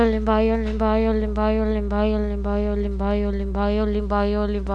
violin

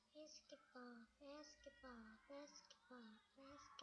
basketball

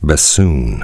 bassoon

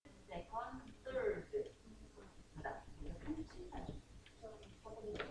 cow

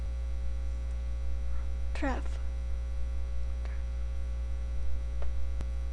traffic

